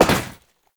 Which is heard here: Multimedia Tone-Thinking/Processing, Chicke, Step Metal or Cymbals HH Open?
Step Metal